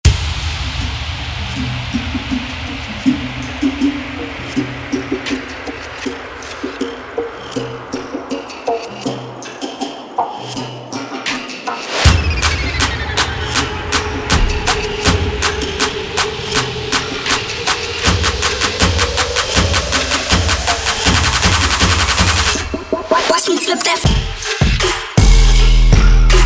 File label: cold trap